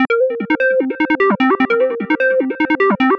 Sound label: 150 Cm, Melodic Loops; M8; Electronisounds